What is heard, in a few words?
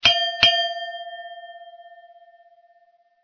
gong